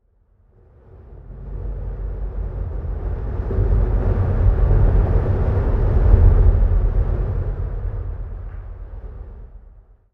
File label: environment temple winds stinger outdoors